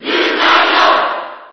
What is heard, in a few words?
Cheer JP; Lucario; SSB4